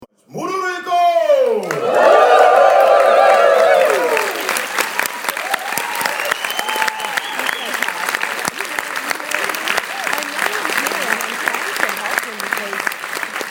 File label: zimbabwe cheer; international society for the performing arts 2015